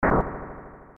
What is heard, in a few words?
door echo; ff3us